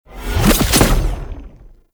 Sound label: Teleport Disappear; Enemies; Abilities; Warframe; Tau; Dax Ninja